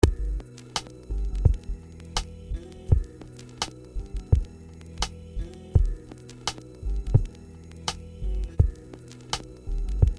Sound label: loop back OFF beat